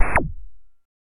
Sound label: Video Game Fire Effe